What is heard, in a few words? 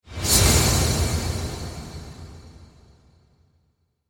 Production Element Whoosh Metallic Reverb; Whooshes; Sound Design